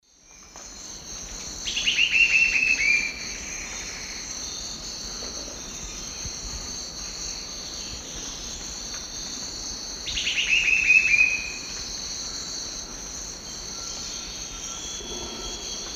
Campylorhamphus trochilirostris, song immature; S. America; Eastern Lowlands

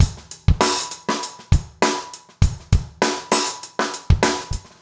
basic funk 100

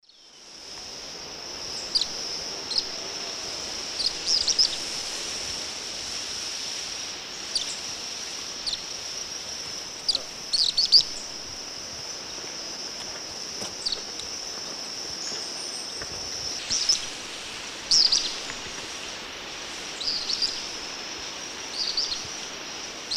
Chaetura cinereiventris, calls; S. America; Eastern Lowlands

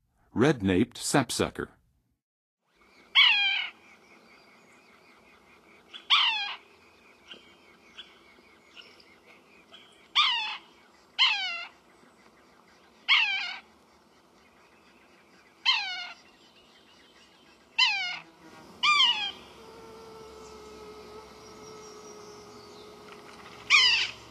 Red-naped Sapsucker; North America; Western USA; Western USA Songbirds

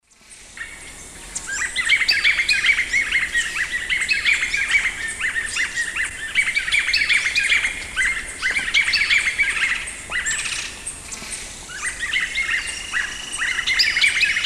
Monasa morphoeus, songs pair; S. America; Eastern Lowlands

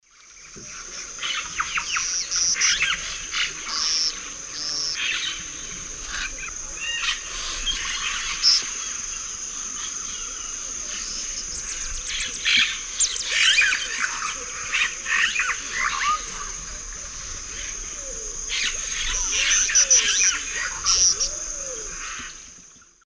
Tachornis squamata, calls; S. America; Eastern Lowlands

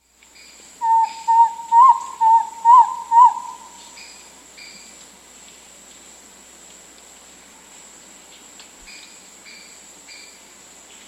Spotted Antpitta, S. America

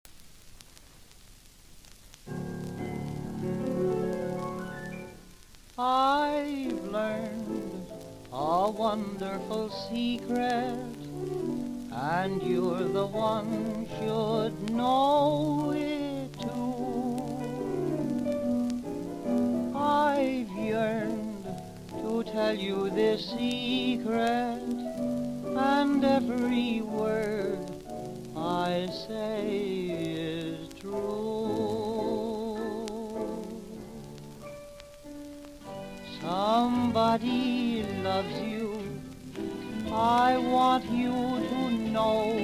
Society Orchestra, vocal; Velvet Tone; Popular Jazz Archive 1920-1936

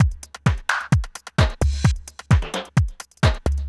dubby house 130

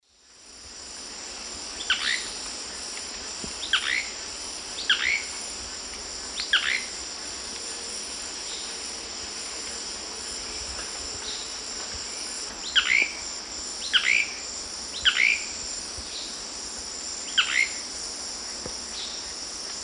Lepidothrix coronata, lek song males; S. America; Eastern Lowlands

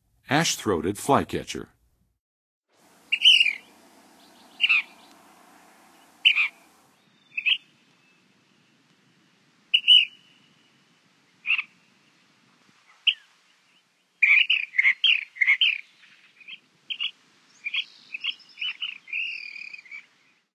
Ash-throated Flycatcher, Songs of Birds; North America; Western USA; Western USA Songbirds

